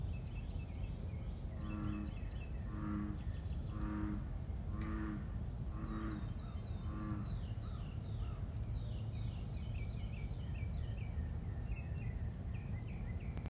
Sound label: bullfrog with intermittent bird songs, field recordings; scout island